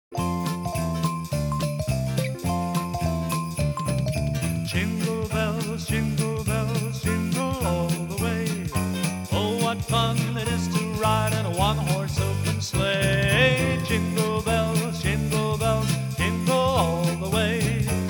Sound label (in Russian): Detskaya pesnya Jingle Bells